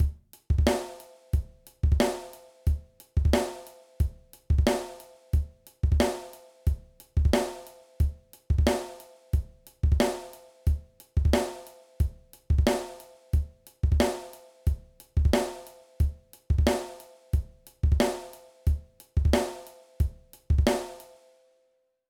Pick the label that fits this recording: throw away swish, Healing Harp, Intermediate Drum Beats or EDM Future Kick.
Intermediate Drum Beats